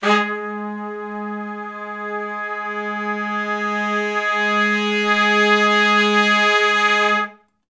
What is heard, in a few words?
2 measure Long Note w Swell (Ab) unison, Horncraft for R&B; Crimson Blue and Fabulous Horncraft 4 RnB; Sections